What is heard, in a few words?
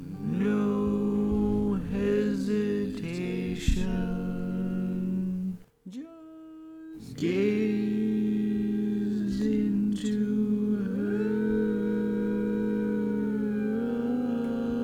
Tenor, barbershop tag